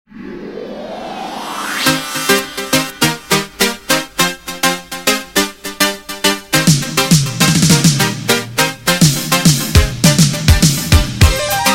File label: Piano